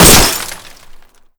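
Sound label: bullet impact auto glass; Modern Warfare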